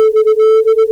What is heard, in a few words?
flange clip